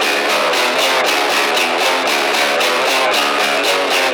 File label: Synth Distorted; Electrocution